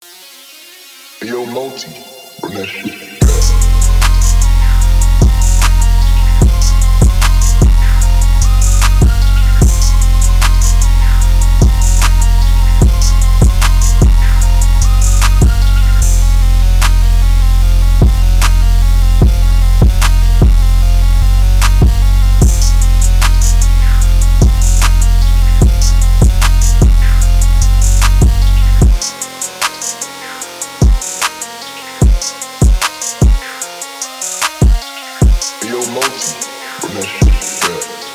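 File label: 150 bpm rage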